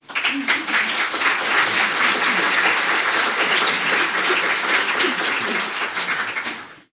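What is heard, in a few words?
medium clapping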